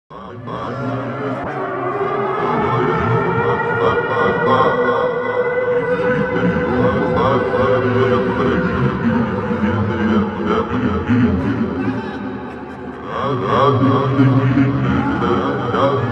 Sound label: radio; Milk outside a bag of milk... OST (2021)